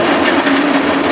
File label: Zipline Go; Playable Tutorial Level; TR2